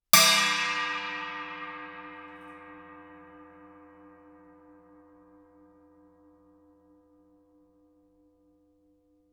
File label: chinese stick normal ff stereo, Suspended Cymbals; Percussion